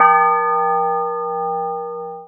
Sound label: BELL